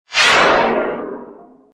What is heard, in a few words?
pull, force